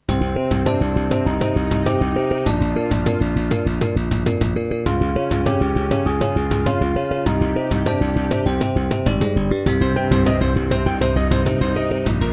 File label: Introduction, Korg NS5R; Hocus Pocus